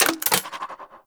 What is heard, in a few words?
Spear Mech; Weapons; Warframe; Tenno; Priest Spear